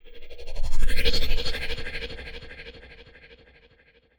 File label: TECHNO FX 140